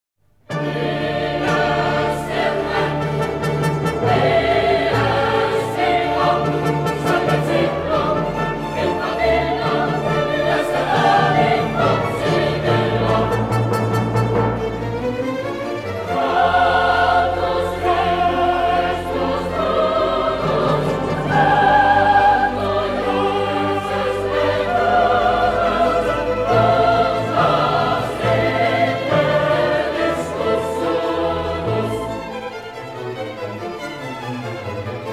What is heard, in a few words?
Requiem in D Minor, K 626 III. Sequentia Dies irae; Mozart, Wolfgang Amadeus